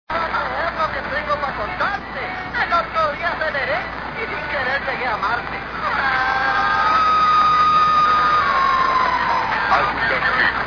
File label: Argentina 1200kHz; R. Goya